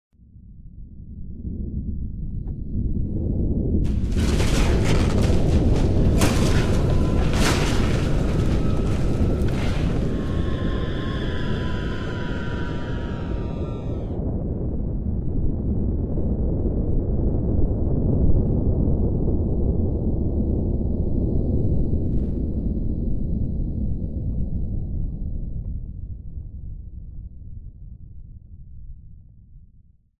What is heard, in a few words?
AMB Intro; ardentryst